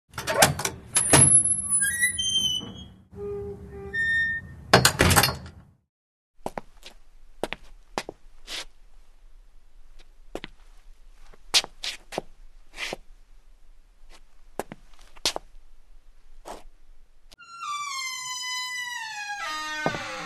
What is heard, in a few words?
door